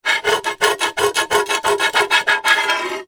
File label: glass thick large piece sharp edge scrape on other; zapsplat